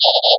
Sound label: ELECTRO